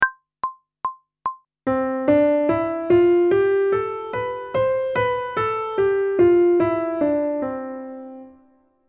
Major Scale one octave ascending and descending, pop vocals; grade 8; Technical Work